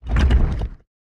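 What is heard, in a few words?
movement heavy push; smite; god atlas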